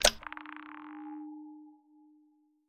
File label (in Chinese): 磁石锁定声